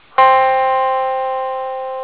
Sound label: Sound Guitar C